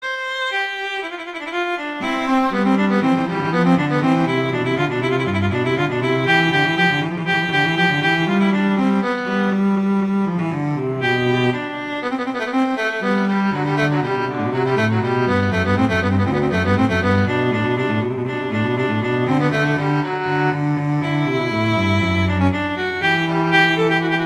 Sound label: Allegro; Telemann; Canonic; Vla Vc; Sonata 2